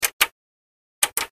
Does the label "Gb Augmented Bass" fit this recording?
No